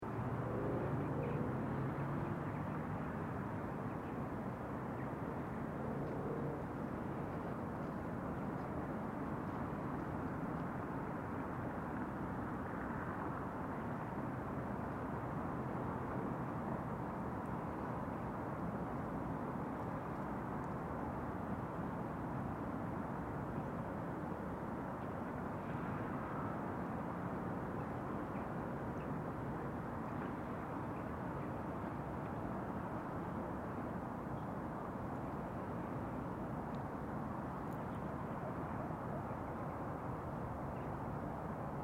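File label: Distant City, Distant city ambience. Use as city wash outside apartment window etc.; Distant city traffic; LYN101 package of City Sounds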